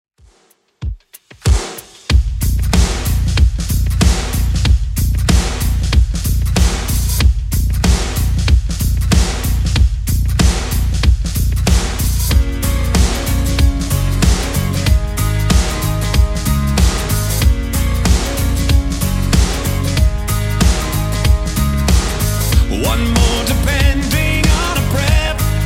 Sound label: 94bpm